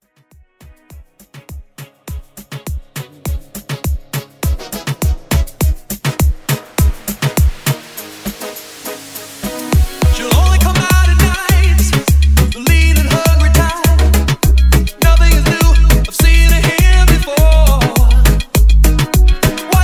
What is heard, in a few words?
Moombah MashUp